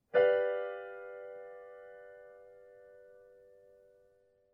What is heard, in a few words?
Dominant seventh